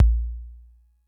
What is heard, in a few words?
kick; MFB-522